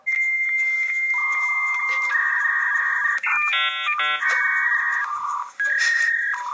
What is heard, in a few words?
Internet Connextion Sound